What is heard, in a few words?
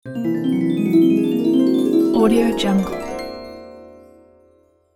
next level